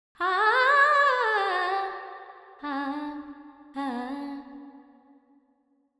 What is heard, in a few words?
Verb 4-4 G 100, Vocals Female